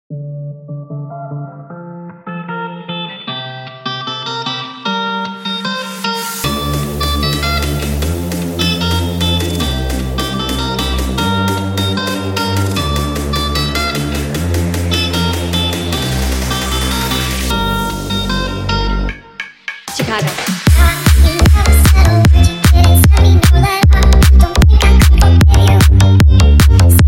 nightcore

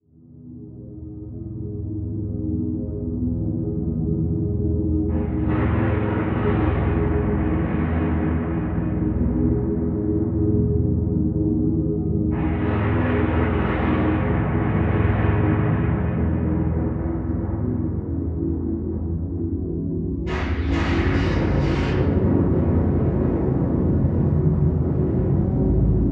Scary Halloween Music; Efectos de Sonido

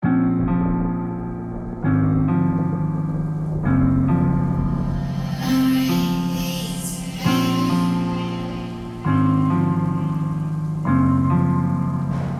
133Bpm Sad Piano

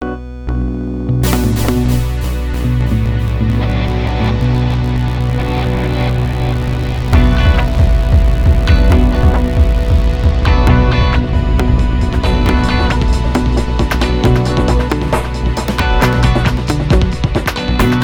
Techno Rock Brega Pop

